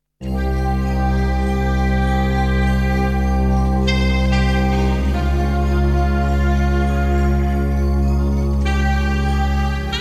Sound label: music